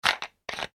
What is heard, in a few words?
bottle plastic lid screw; Plastic Bottle Lid Screwing; Materials; foley; McKinney Sound